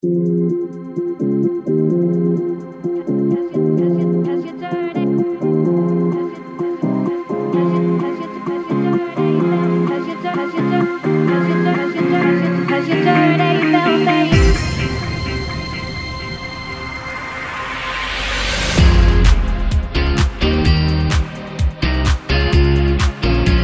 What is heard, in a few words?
GET THAT BEAT